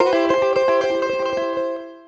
Ac 12 Str Gtr C free, Twelve String Guitar, African Guitars; Quazibeat - African Secret